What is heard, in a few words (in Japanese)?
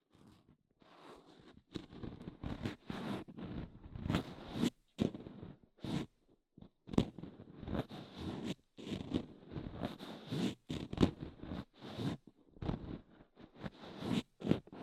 耳かき音30分 L